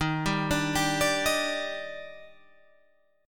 Eb Major 7th, Guitar, Open G

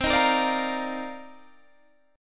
C Minor 11th C

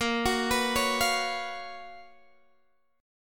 F sharp Major 11th A sharp

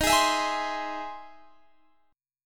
E Augmented Major 7th

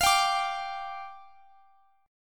D Minor Double Flat 5th, F

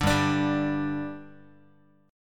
G 5th G, Guitar; G5 strummed

